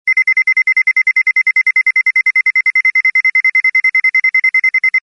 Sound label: beep constant electronic